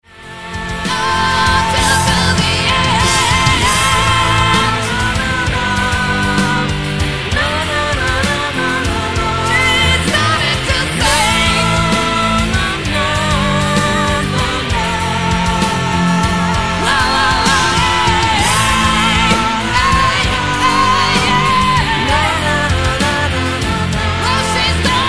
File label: Singing na na na